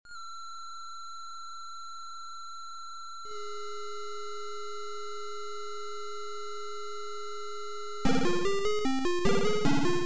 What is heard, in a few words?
Techno AYx2 Turbosound; Twilight Techno